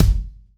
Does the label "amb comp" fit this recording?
No